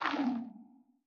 幽匿感测体停止点击声

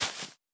海绵 踩踏声

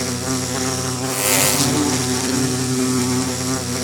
蜜蜂愤怒声

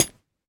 锁链踩踏声

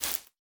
蜘蛛网破坏声

